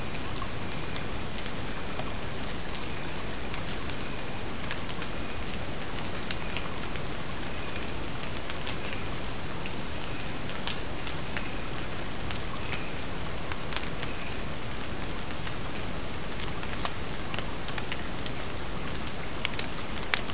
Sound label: Kiwi call with rain